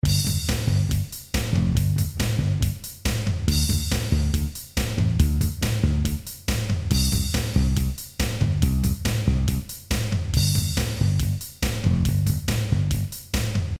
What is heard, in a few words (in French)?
Rythme; Montage